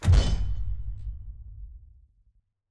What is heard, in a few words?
spectre power up light on; colony; Titanfall 2